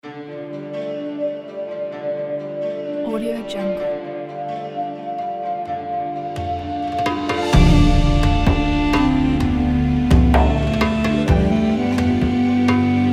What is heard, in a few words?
arabian days; holly; mospiration ne